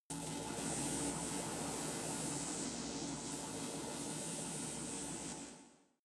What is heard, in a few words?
space inside biomedical alien shuttle static